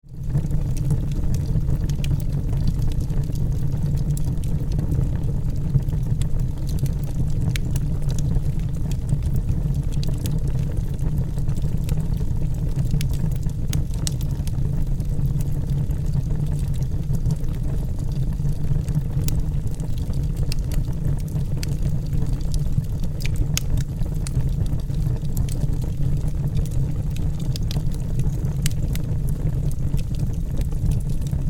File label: Fireplace